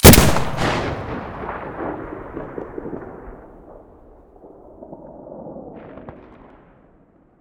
awm muzzle stereo, weapons

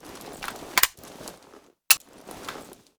gauss misfire, weapons

